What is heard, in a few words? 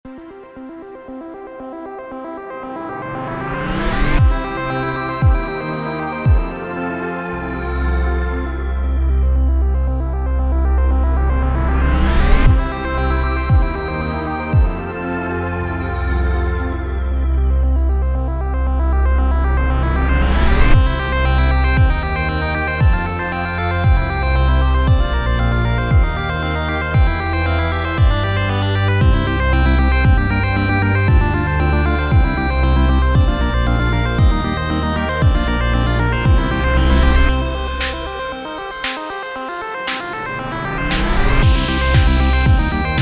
chiptune